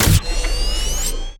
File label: Charge Weapon